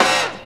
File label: Short Stabby; Mo' Phatt; Digital Sound Factory Mo' Phatt